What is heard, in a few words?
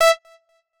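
Beep Lead High E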